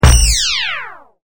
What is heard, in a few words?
Boing